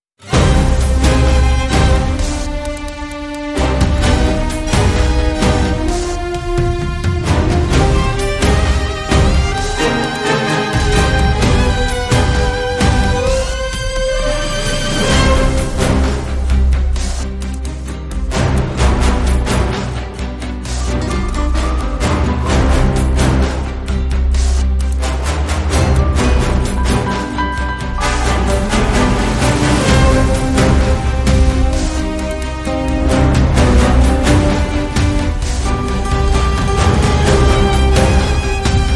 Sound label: Dangerous Tango